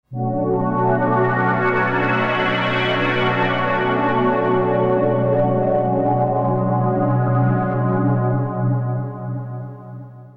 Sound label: Pro12 Pad; dinopark